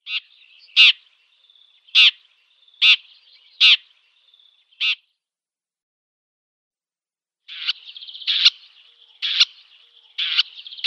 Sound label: Red-backed Shrike calls